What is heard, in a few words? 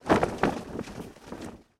Idle Fabric